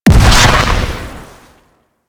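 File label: Orange Mine Projectile Explosion; Warframe; Venus; Venus Commander Orange; Abilities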